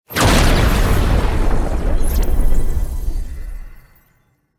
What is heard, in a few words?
thunderstorm teleport